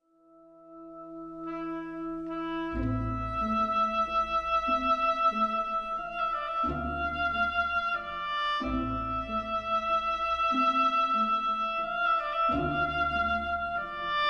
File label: Oboe; Bizet 2nd Movement